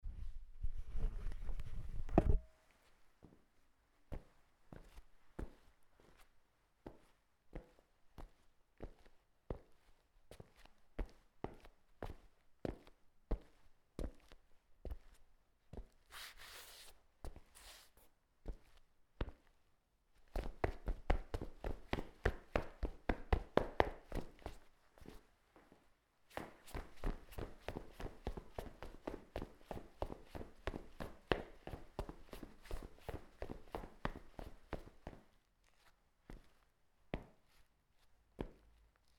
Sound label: Footsteps On Concrete